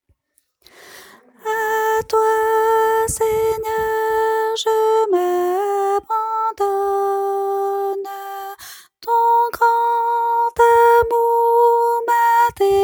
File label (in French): soprano